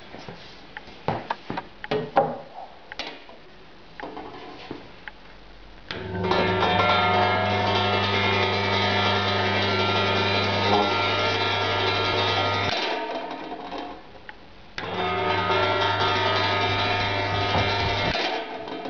Induktionskochplatte Eigenbau

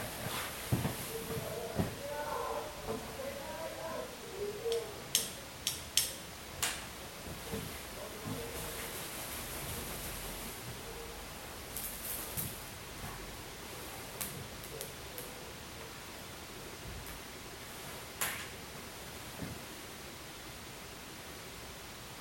Elektrostatik Influenz Versuch Wedelgenerator

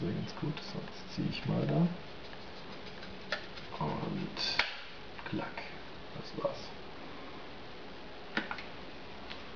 Spielzeugpistole Laden

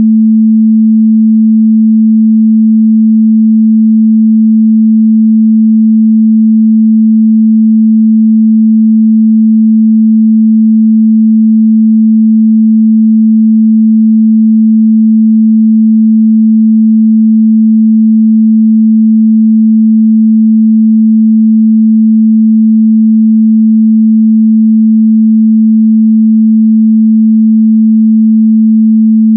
Sinus 220Hz

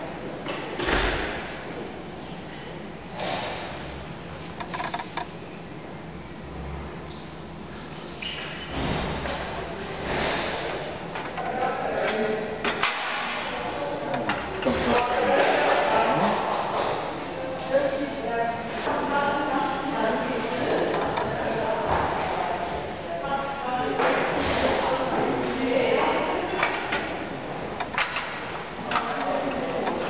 Wagen schwingen

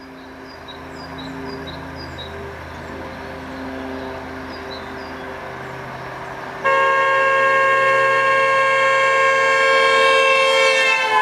Doppler Auto

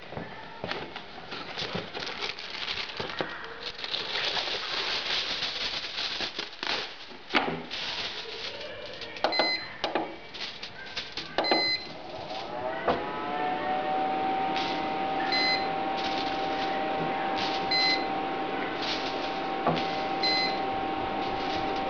Induktionskochplatte Alufolie

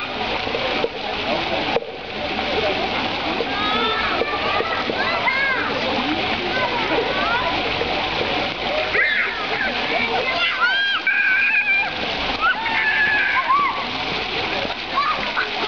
Springbrunnen unterschiedliche Höhen

